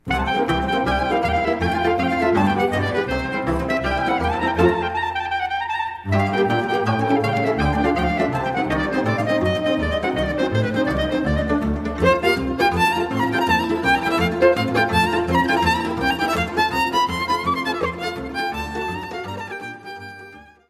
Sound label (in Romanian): Sirba pe Batute, Roumanie